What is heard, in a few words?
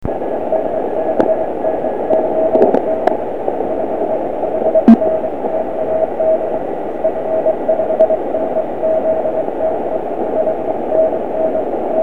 cw